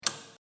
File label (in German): WC Kondomautomat